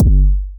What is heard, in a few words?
hard industrial techno kick